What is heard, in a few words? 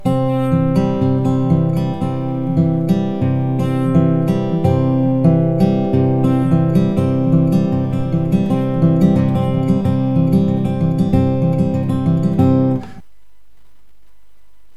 Fingerpicking Pattern, guitar